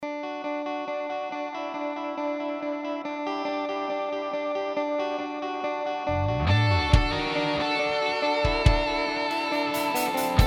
Rock Concert

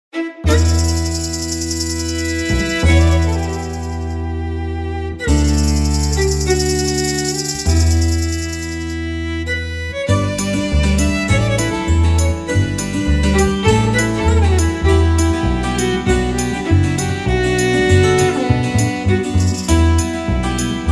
Celtic Spring

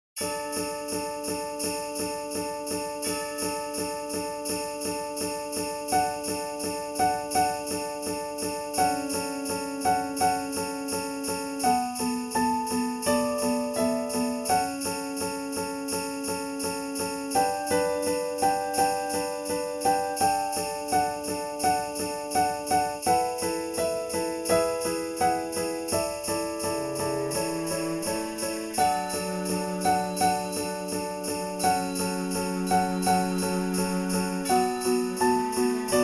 Simple Jingles

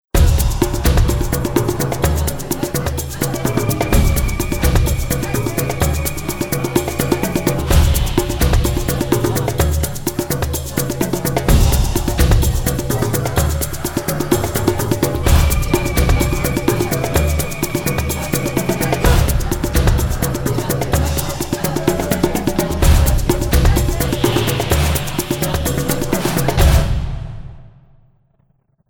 Voodoo Drums

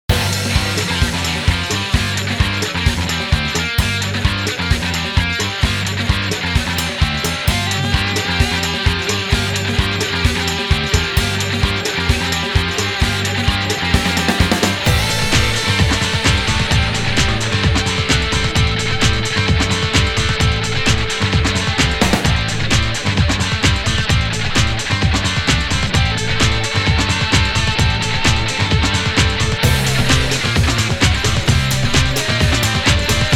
rock guitar

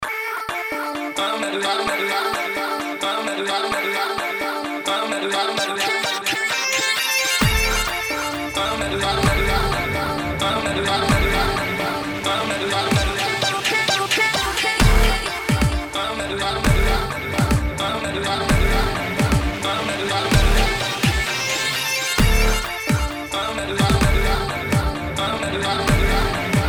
Dubstep rough

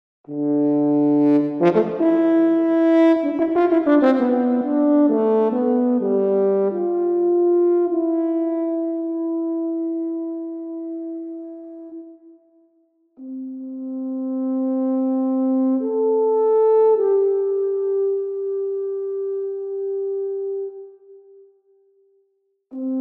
Horn solo

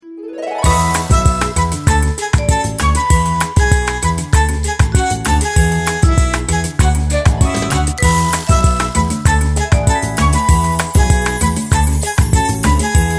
whimsical samba

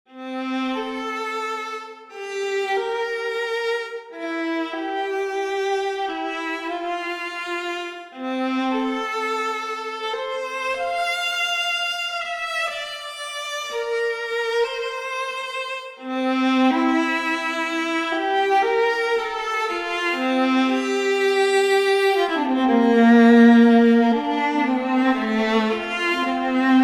Adagio Viola

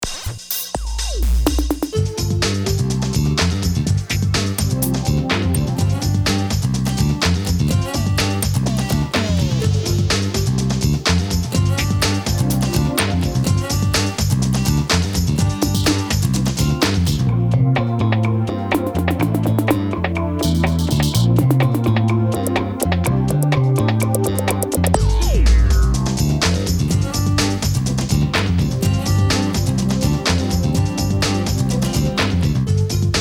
pop track

